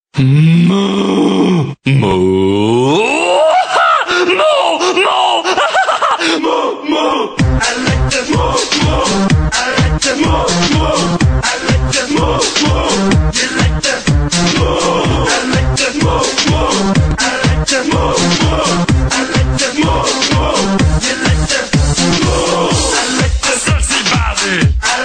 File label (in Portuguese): vaca maluca; toques para celular